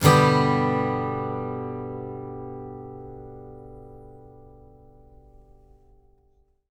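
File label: acoustic guitar G major; Sampleheads - Whole Lotta Country 1 & 2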